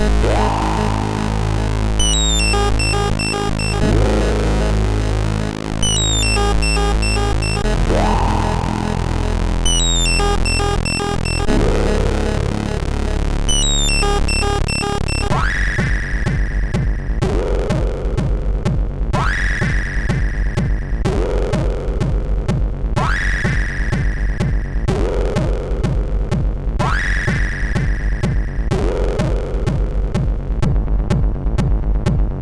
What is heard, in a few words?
UFO sid MOS6581